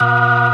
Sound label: B3 C 2, ORGAN; VOCAL ORGAN; ORGAN #4